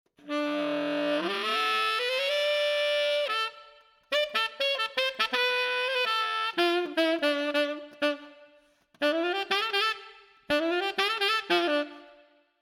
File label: Tenor Sax